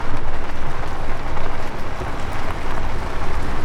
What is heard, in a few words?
driving